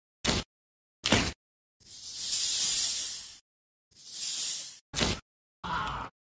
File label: wax; کندن وکس